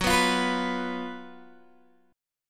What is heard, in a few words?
Gb Major 7th Suspended 4th, down, Guitar, Open D